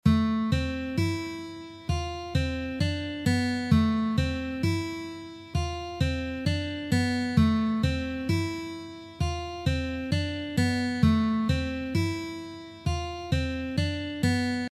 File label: Intro Slow Tempo